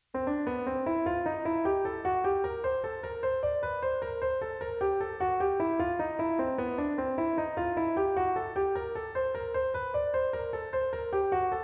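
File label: Dominant Seventh Chord Embellishment, jazz piano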